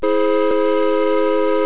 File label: horn